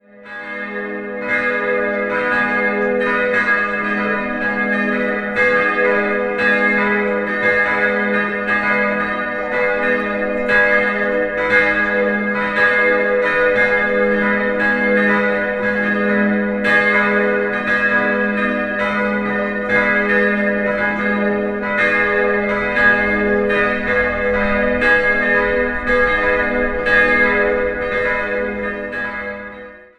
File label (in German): glocken; Friedrichroda